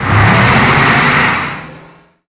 BTR80 Engine Acc Start; DCS

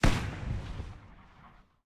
aa fire small, guns

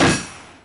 Damage Bullet Ricochet; DCS

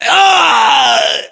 death, voiceovers; english

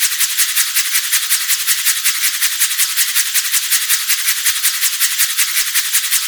Rotor UH1H High; DCS

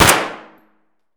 grach fire indoor close; Escape from Tarkov

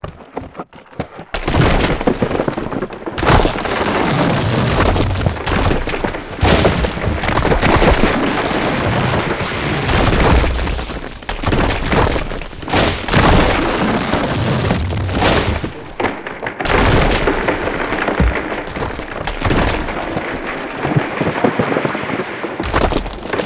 avalanche emitter; Modern Warfare

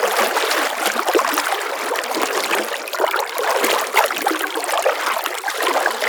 river; ambient; World of Tanks Blitz

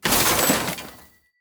objects, World of Tanks

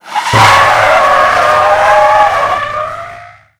tire skid cement long

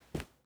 ui drop cloth; Rust

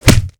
impact flesh; Modern Warfare

